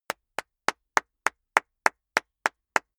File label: clapping medium fast; comedy-cartoon; koerpergeraeusche